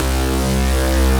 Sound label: C Bass, DnB One Shots; Electronisounds; DnB Rebellion